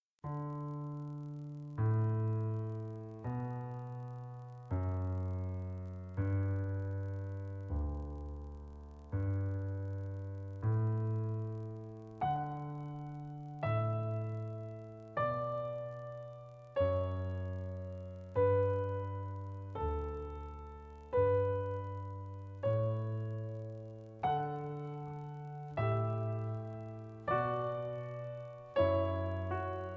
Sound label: pachelbel